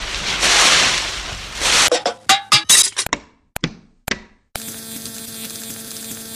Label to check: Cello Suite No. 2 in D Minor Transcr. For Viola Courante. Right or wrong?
Wrong